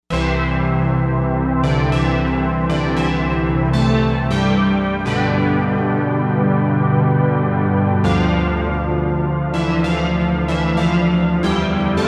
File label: 80s Pad, Novation UltraNova; Factory Patches